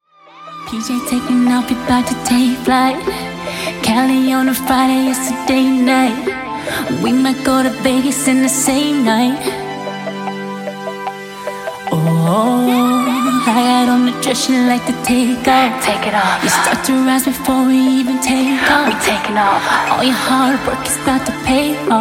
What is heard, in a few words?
Dj Intro Outro 75Bpm